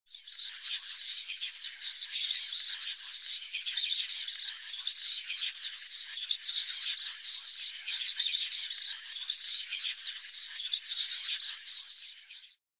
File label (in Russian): zvuki prizraka i letuchih myshej